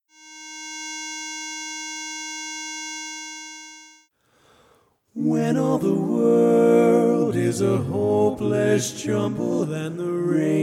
Baritone missing

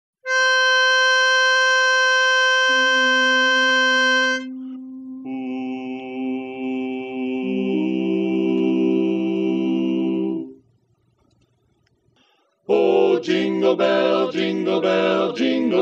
bari; Christmas

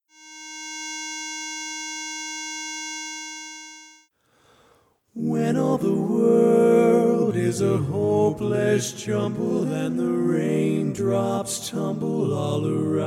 Tenor missing

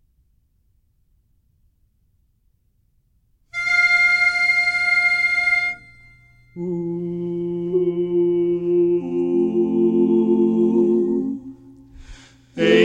4 part; Christmas

